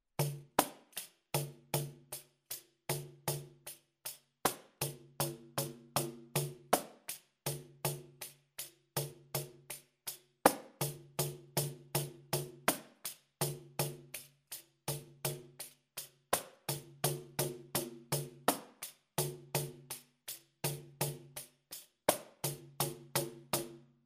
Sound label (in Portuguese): samba marcacao slaps variacao slow, pandeiro